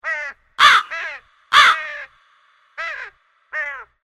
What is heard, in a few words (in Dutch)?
Kraai